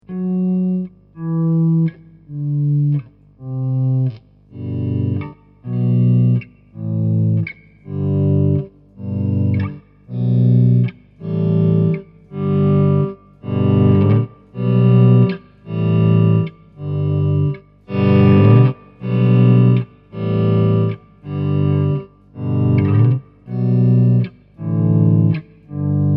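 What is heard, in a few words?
Tremarella auto-volume, optical tremolo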